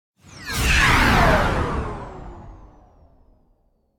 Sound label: Space Ship By - Fast Stopping; Hollywood Edge; sound designer's toolkit v2